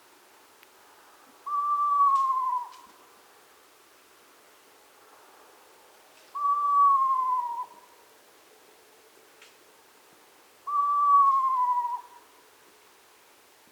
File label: Eastern Screech-Owl